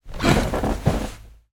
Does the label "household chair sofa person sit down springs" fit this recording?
Yes